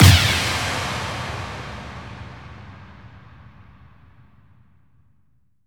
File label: Impacts, Effects